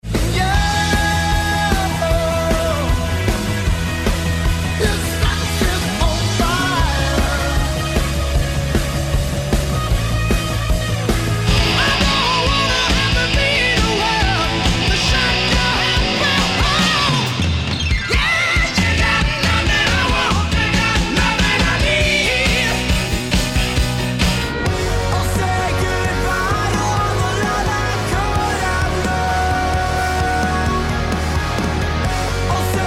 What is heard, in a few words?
150bpm